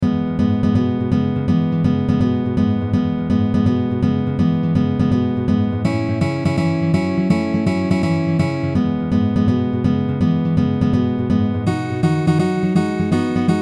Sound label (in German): Shuffle Uebung